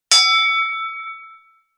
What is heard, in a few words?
Boxing Bell; Soundzabound